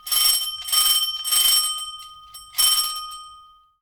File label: Door Bell; The 39 Steps